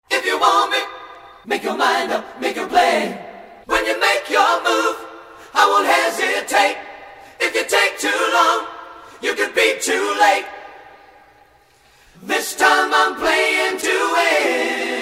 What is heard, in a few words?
vox, acappella